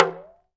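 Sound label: PRC talking drum, Africa; Roland LCDP14 Africa VOL-2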